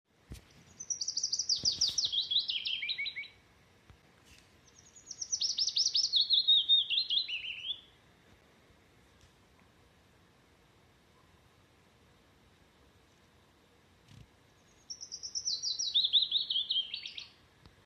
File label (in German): Fitis